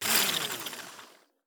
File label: handblender stirrer attachment